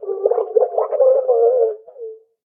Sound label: drown